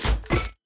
atk mortar

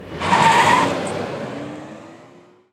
drift

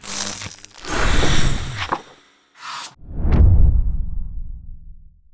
mixed gacha

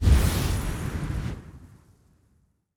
card shining

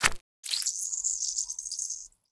inject

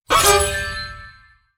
atk violin

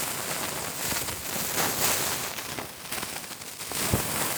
fire lead wire

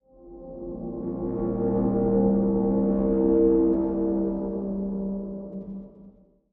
air buzz

